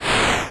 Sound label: close; coolmathgames; 60 second santa run